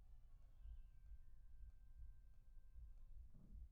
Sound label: PIANO